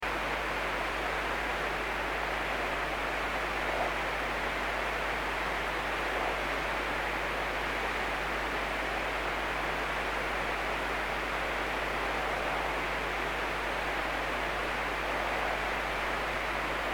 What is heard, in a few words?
scanner noise; devices accessories utilities; NS Studios - ultra sound collection